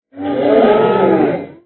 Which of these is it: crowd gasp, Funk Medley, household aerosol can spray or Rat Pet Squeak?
crowd gasp